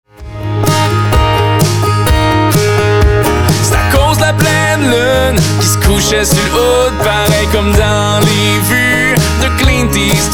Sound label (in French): acoustique